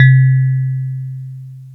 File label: DX PIANO